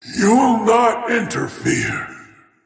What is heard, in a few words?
attack pain; Void Spirit